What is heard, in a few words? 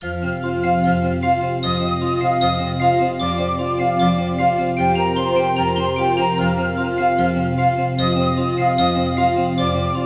Dolphins; Dolphins StartWin; 250 Desktop Themes